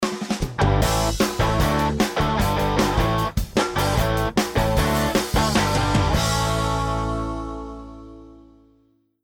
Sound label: ROCK PORTFOLIO